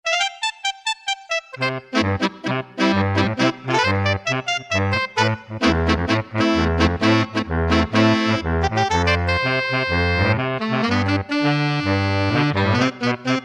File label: Latin Sax, Alesis Z7 Latin